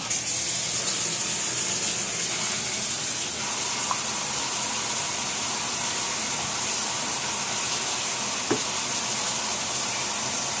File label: washing